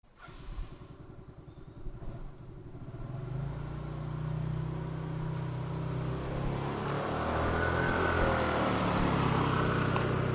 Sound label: bike, binaural